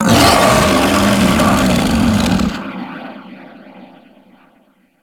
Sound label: Feral Vocal Idle Howl; Enemies; Kubrow; Warframe